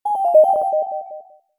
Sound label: Mail Received